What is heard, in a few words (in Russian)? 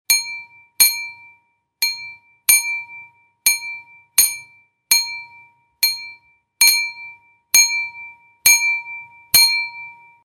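звуки посуды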